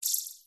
negative touch sound fx arcade casino kids mobile app